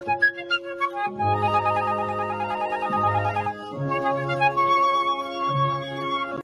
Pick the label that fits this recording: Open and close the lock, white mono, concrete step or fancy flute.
fancy flute